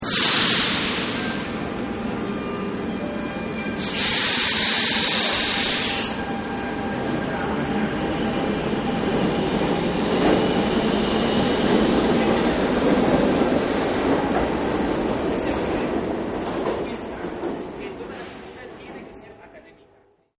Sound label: Subway Departs; Subways; Vehicles; Partners In Rhyme